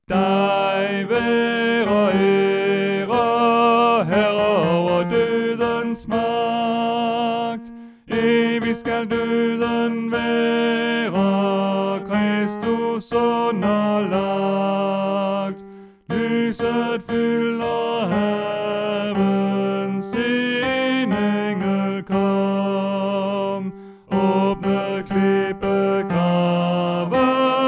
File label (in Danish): Tenor; oevefiler